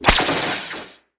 light hit, saber

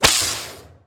light hit, saber

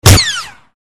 reflect, saber

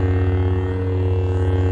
saber hum

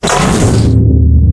activate, nanosword

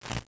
spark

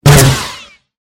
saber hit

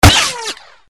reflect, saber

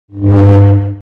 saber hup

